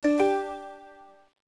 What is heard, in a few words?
messenger new email